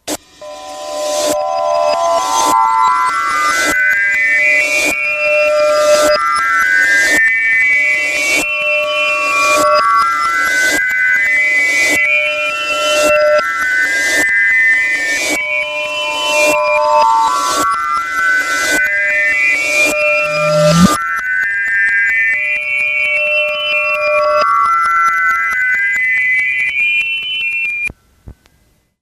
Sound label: Backwards Bells; Lovedolls Superstar Soundtrack